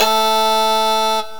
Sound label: Bag Pipe; GMPlay; Ethnic